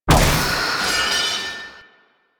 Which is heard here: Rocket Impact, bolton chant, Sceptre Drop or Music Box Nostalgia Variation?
Sceptre Drop